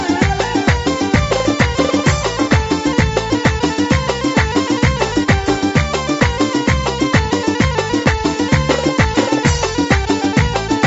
Bandari, Melody

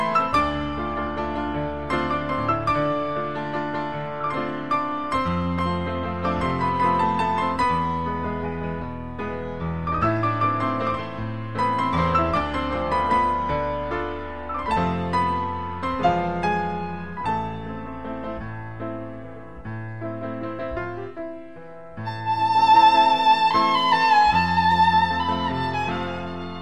Violin

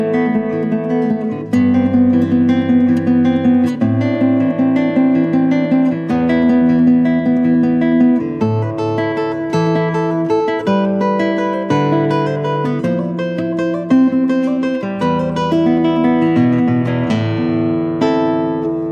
Guitar

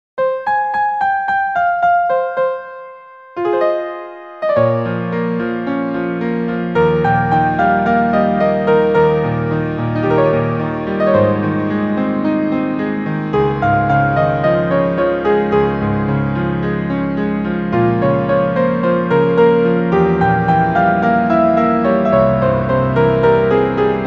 Piano

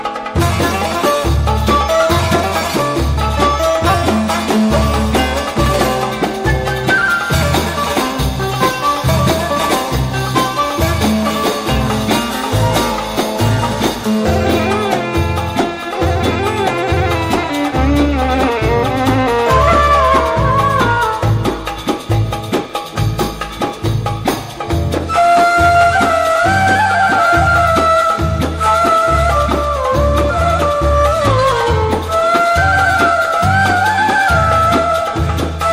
Flute, play back

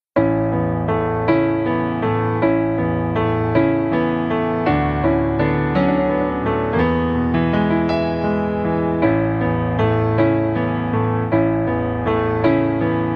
Piano

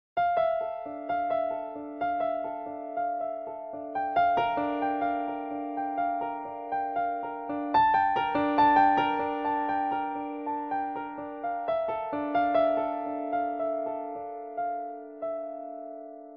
Piano

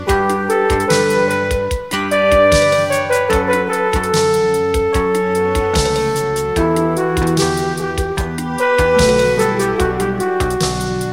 Flute

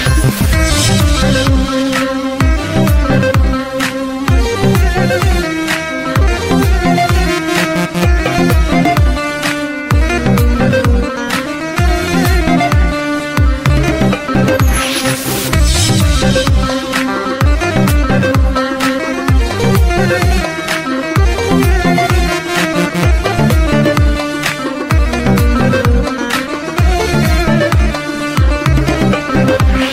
Accordion

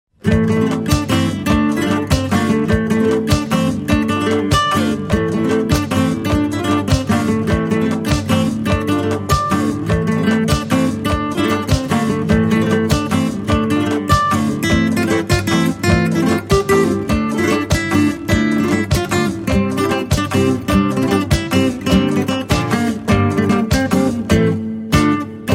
Guitar

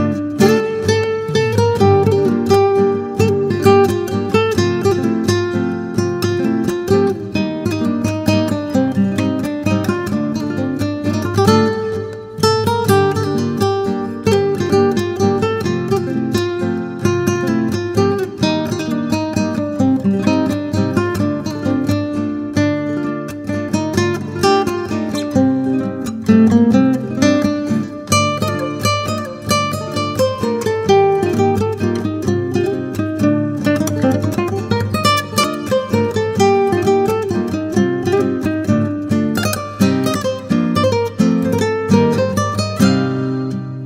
Guitar

